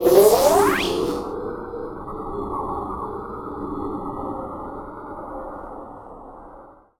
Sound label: SFX Stage In; Wario Land: Shake It!